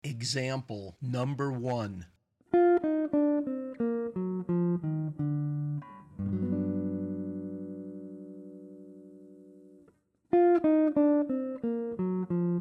Altered Dom Scale; lead skills materials

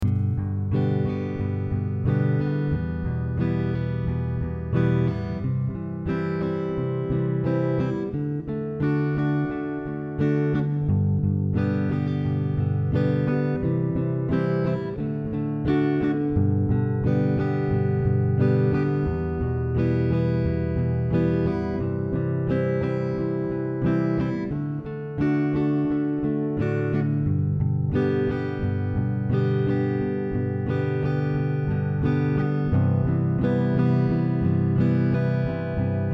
Basic, strum style tunes

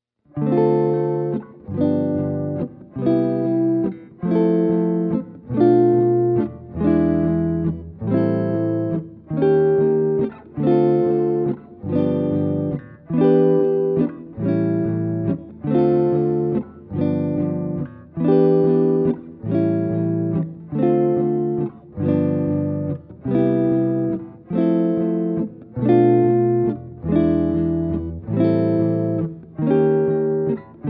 bar chord comp; jazz tunes

